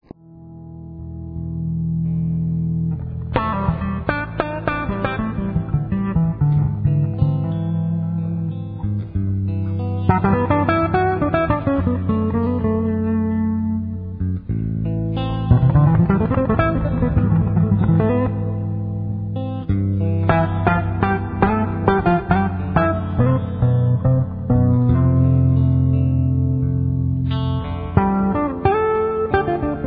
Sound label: Solo-Bass live; Seeheim nightlife